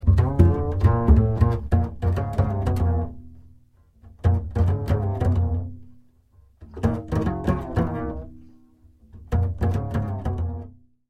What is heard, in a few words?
question answer